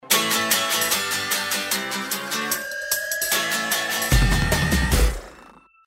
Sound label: Tier 3 Found; 101 Dalmatian Street Boom Night Rescue